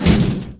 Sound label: Pickup Underwater O2 Tank; SFX Kit; Pickups